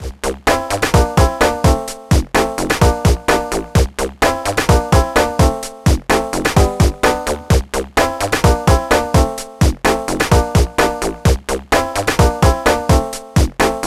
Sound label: Arcade Room